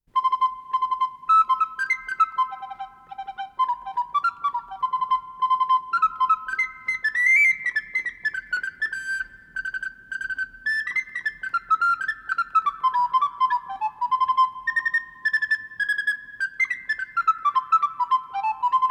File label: hornpipe